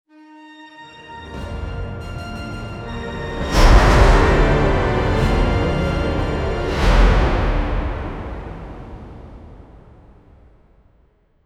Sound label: epic string orchestra